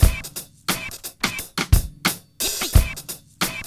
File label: BEAT, Hip Hop